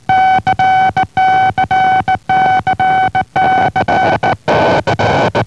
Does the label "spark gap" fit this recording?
Yes